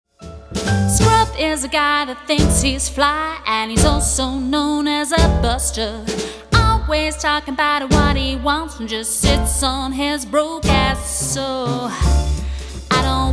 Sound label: Lounge